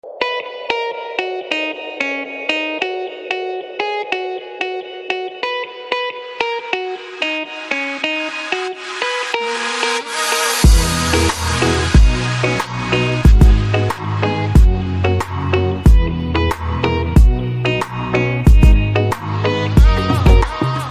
Ringtone; Saaho

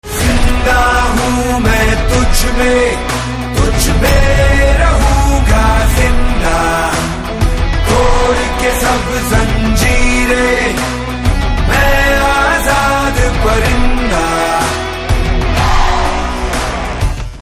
Ringtone

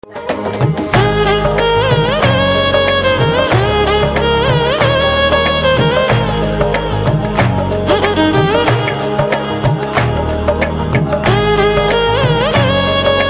BGM Violin

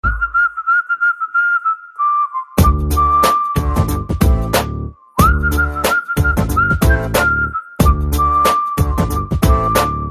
Whistle Ringtone; Maharshi First Look Teaser

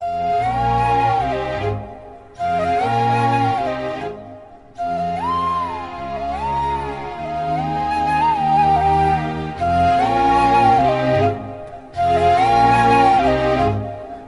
BGM Flute Ringtone; Raguvaran BTech

